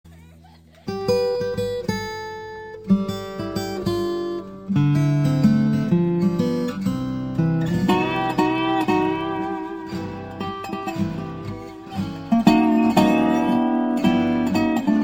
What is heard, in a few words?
Hawaiian Slack Key Guitar